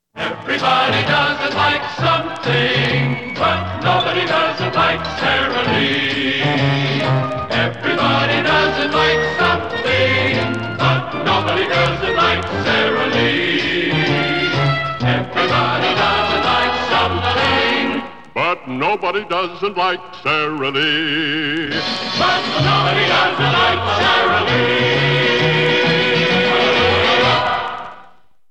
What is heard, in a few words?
Jingle